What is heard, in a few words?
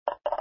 Empty Cup; papas pastaria to go; android